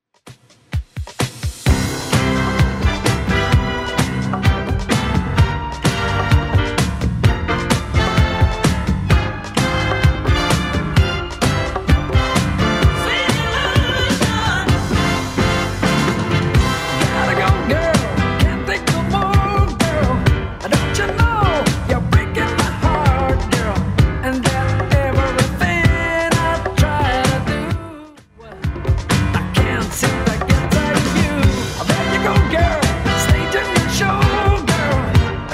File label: 70s, 129bpm; Redrum